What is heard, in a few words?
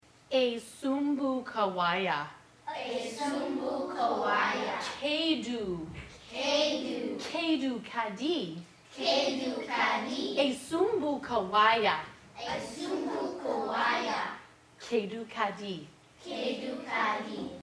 Lyrics Only